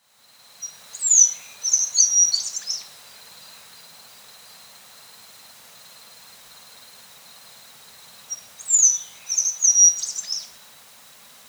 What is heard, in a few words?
Bluish Flower-Piercer, Diglossa, Nature's Songs, S. America